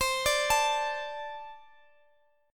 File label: A Minor Double Flat 5th